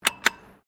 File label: Traffic Lights Australia Pedestrian Button Press; Traffic lights pedestrian crossing; Traffic and Cars